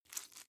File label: Sex Noises; Hilzartov; Fairy Size Doesn't Matter